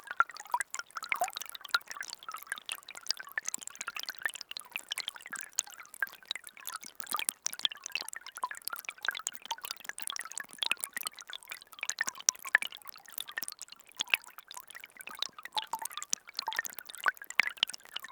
Rain underwater at surface; boom library; Thunder And Rain

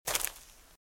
Footsteps - Leaves - Walk - Left; RadwinIsland

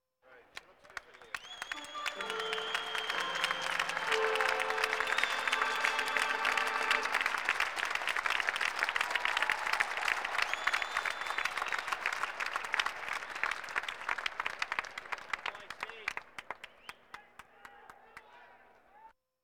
Sports crowds

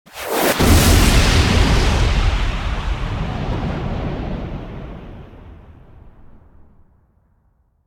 Bomb Explosion; Hollywood Edge; sound designer's toolkit v2

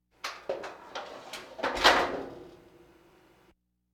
Laugh tracks

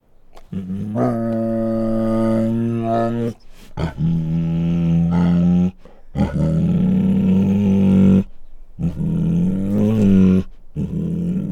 Female Bengal Tiger Snarling Growls; Sound Ideas

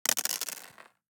DICE on Plastic, Throw and Roll, Mini, 6 Six Dice; Samples; ArticulatedSounds; DICE Sfx Party (Extended)

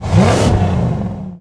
Rev, Trucks

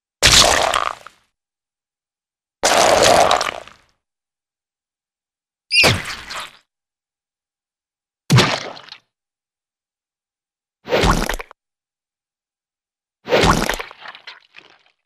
Dirt Or Rock Spill - Impact - Gooey Splat; Cartoon; Hollywood Edge; Cartoon Trax

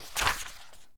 Book Page Turn; SFX Kit; Foley - Misc

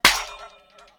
Hit

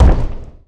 Boxing Ring Bodyfall

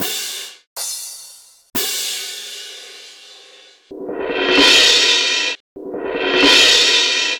cymbal mix; best service Blue Box; percussive fx

